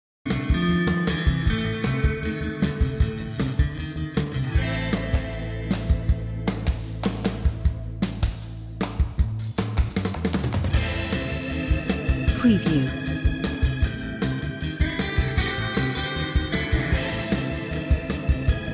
rock, surf rock with organ and claps

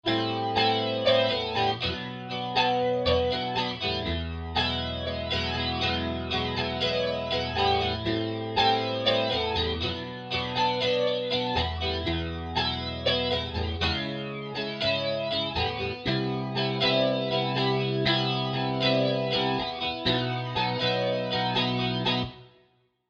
delay fx

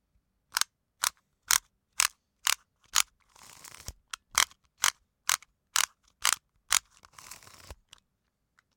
Tape Measure Rhythm

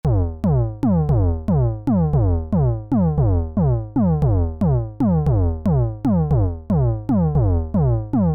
modulations

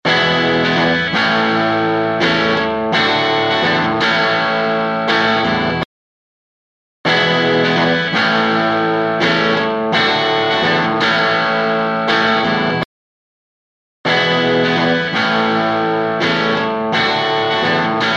guitar; waves

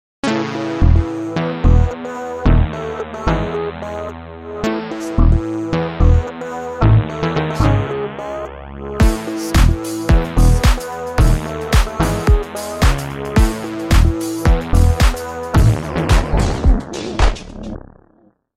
Beat processed pitch drop; waves

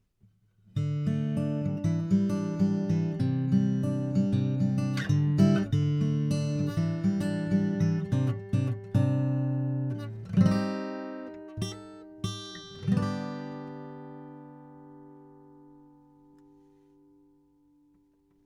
guitar direct; 吉他-直通模式; SHURE MOTIV MV51; 木吉他模式

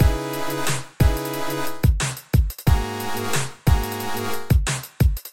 LFO Modulation

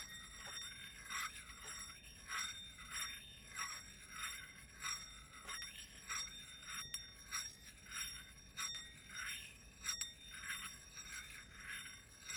Parts Dish Spudger Rhythm WARP 120bpm

